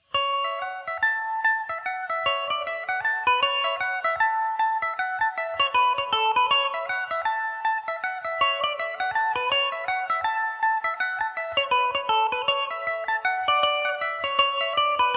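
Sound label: A major revelation, irish mandolin; The High Road To Linton; Revelation Jaguar electric mandolin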